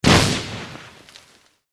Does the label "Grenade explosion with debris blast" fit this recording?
Yes